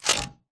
bt footstep jog parts; Titanfall 2

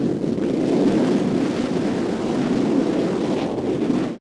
boost hover body rocket; Titanfall 2

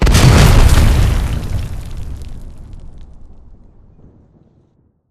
Explosion Rumble Exterior Close Punch; Boomtown; Titanfall 2

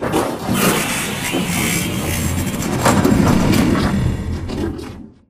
spectre 3d printing; Titanfall 2

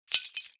imp bullet lightballistic solidglass debris

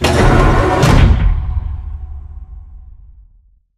wall run panel move; beacon; Titanfall 2

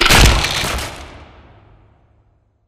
Assembly Grass Grinder Start; Boomtown; Titanfall 2

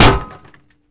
Imp Bullet Light Ballistic Solid Metal

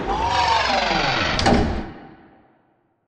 Assembly Plate Lift Down Rock Close; Boomtown; Titanfall 2

